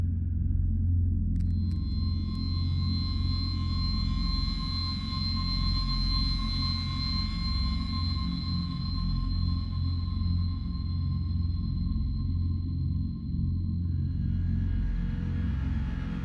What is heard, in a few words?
Ingame Menu Music; soma gamerip 2015